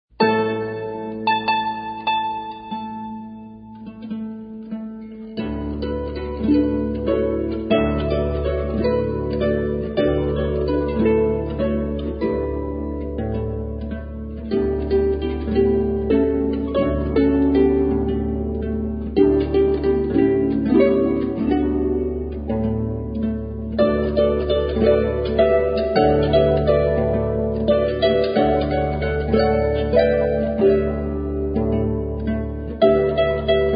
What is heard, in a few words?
tango